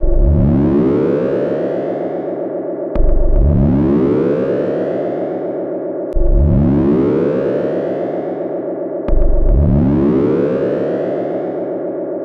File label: Tape delay FB Loop; radiosonics